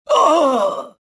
pain

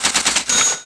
gui unlock; Tony Hawk's Project 8; XBOX

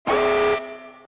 alarm

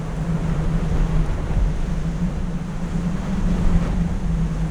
wind; Soldier Of Fortune II Double Helix; XBOX; kamchatka

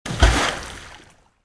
bodyfall water; player

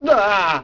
bail vox; Tony Hawk's Project 8; XBOX; bails

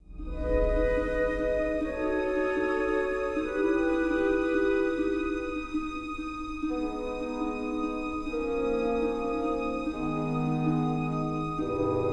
music flourish, intro

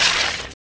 sword; Pirates Of The Caribbean; DUEL

